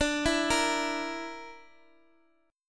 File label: D Suspended 2nd Sharp 5th D, Guitar, Open D